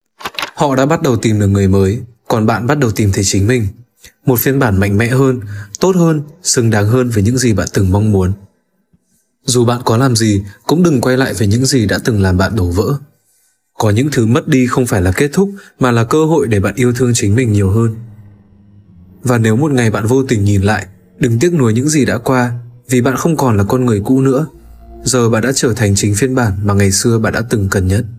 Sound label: sound chill vocals